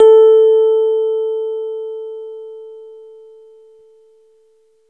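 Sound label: piano elec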